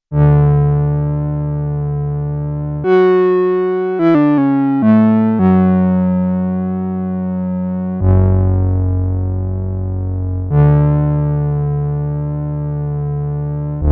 Lo Fi Lead

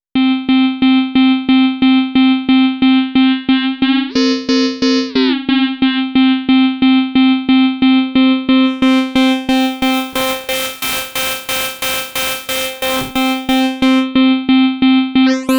Operator Modulation Feedback

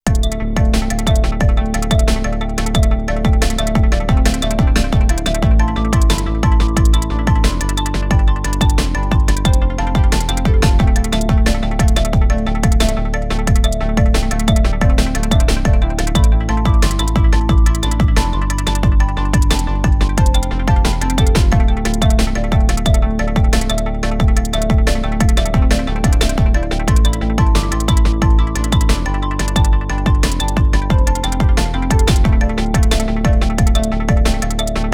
Electro

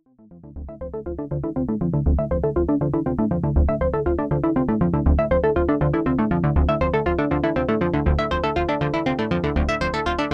Juno 106

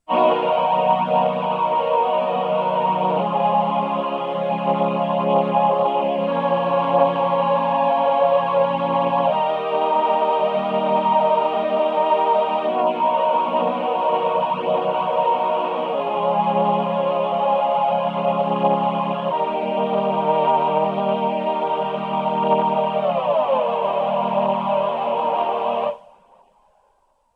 Human Voice

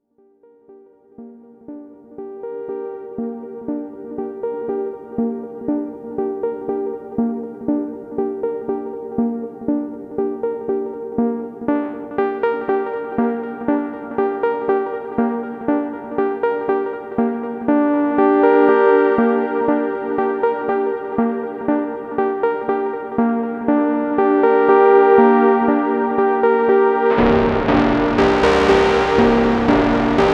DX7 V Melodie

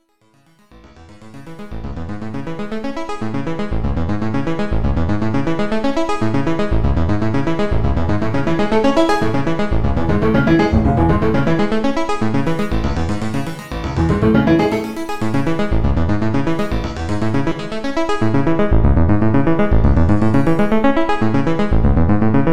Arp

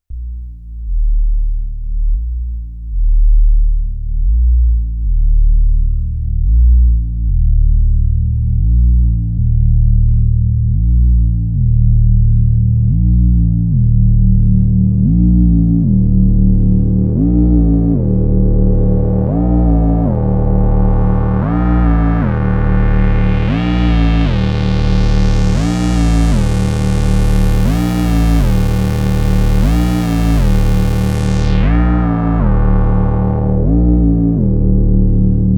Behringer Neutron Synthesizer Detuned Bass

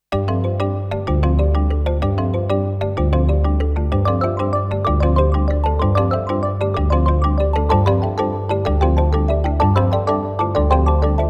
Kalimba Ringtone

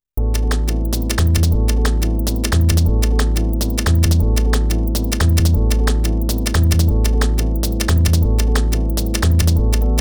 Break

